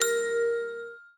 ui card bounce

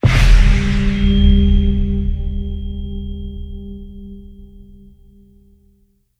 cursed doll

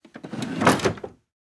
open drawer

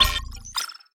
ui next tack

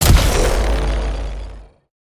skill breaking line

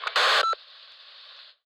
transmission get

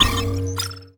ui last tack

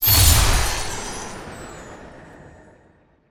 atk icesnwr

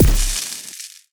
cleaner crash

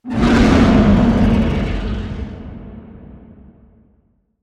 huge monster roar

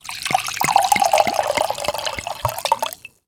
pour wine

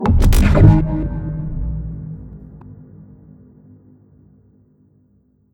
e skill cello aoe

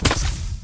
fire spear

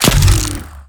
bird arrow

